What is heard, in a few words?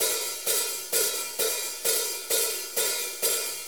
4-4 HiHat Loop; Drum Tools; 4-4 Drum Loops; HiHat & Ride Loops